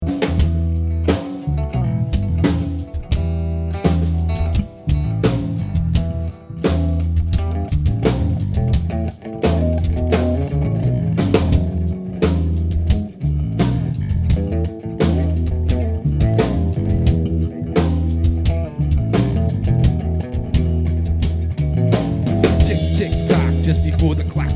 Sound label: Opening Jam